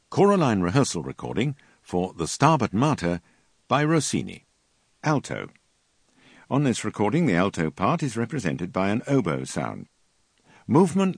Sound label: Alto; Rossini Stabat Mater